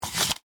Gun Holster Insert, Warfare, Gun, Holster, Insert, Handgun, Pistol; Pistols; Blast Wave